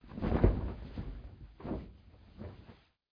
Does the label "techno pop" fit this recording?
No